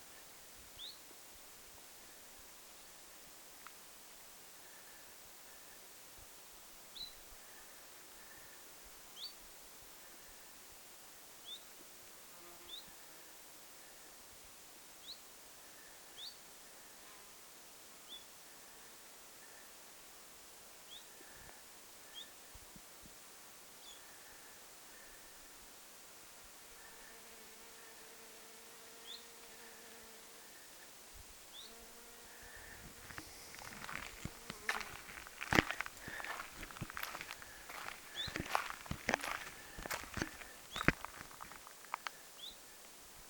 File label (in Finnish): zuit vaiko hyit-tiltaltti